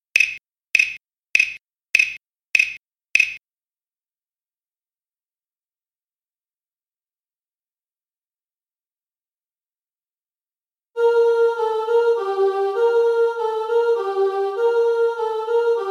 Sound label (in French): carol of the bells piano, voix